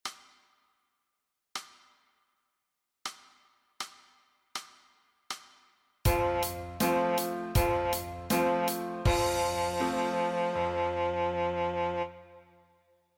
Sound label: sax D